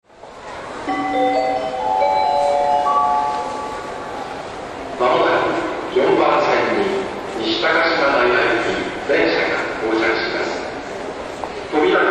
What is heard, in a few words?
arrive, rail sounds; Toei; Mita